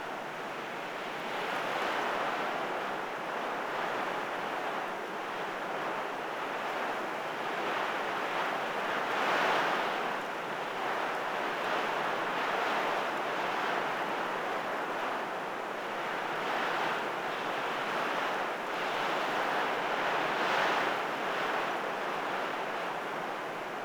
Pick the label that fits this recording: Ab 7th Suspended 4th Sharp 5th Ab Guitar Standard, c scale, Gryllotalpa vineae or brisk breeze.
brisk breeze